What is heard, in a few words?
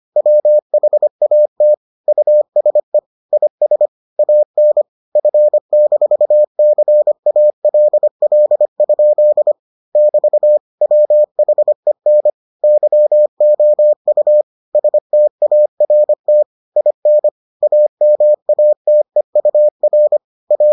morse